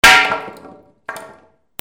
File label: FALLING